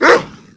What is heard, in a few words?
Bark